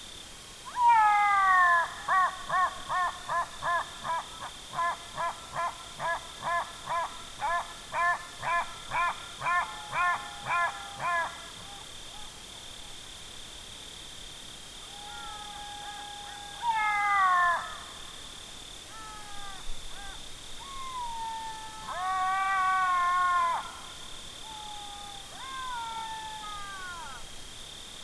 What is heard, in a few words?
Canis mesomelas howl